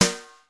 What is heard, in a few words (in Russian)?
malij baraban odinochnij gluhoj klassicheskij